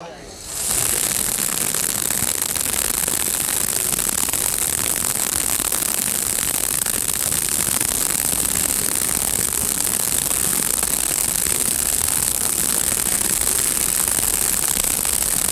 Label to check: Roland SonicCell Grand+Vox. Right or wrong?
Wrong